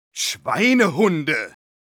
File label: Medic negative vocalization, de; "Schweinehunde!"